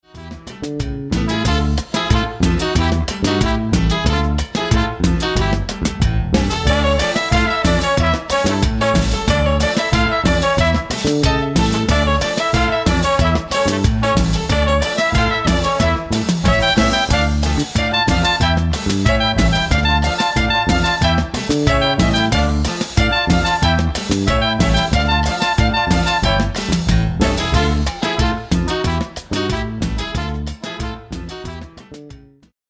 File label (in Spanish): Cumbia Veracruz